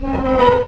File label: door metal